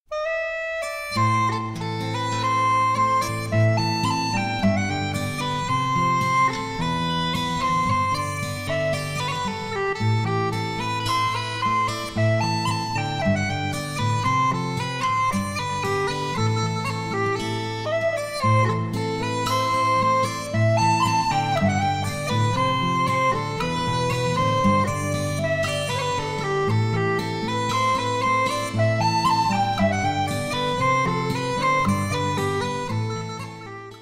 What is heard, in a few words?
chanter, uilleann; seery